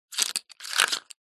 leisure poker chips handling short; zapsplat

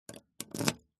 vehicles car key insert lock ext 4x4; zapsplat

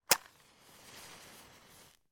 household matches lighting strike box many

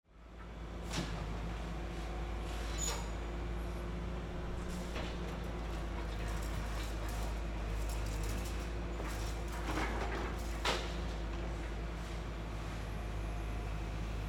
Ambience Construction Site

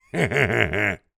cartoon male laugh gangsta; zapsplat

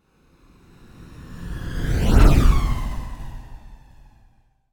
science fiction spacecraft ufo small pass fast; zapsplat

